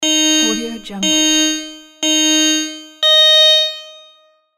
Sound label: countdown beeps 321 go; floraphonic